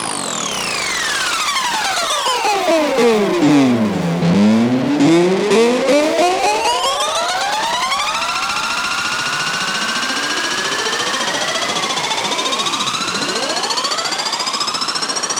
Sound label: riser crow, fx